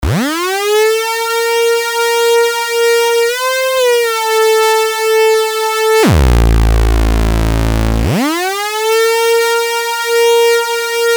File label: anim saw; tellun